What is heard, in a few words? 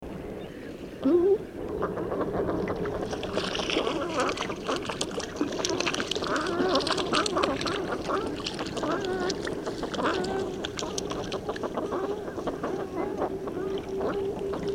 Spectacled Eider calls